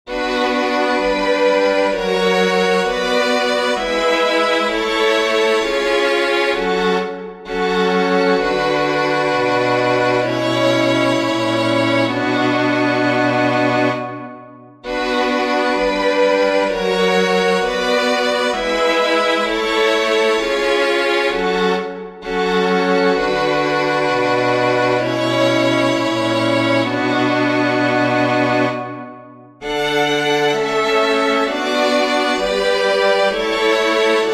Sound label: chorale for string quartet; bach johann sebastian; lobt ihn herz und munde